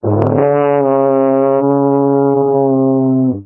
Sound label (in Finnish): tuuba